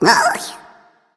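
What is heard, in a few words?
nonverbal agree; smite; ratatoskr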